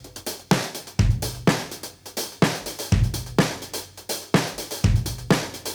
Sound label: Modern Breaks; Touch Loops; ClassicBreakSessions